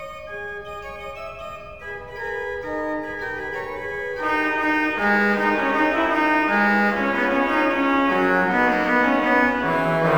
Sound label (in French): tromp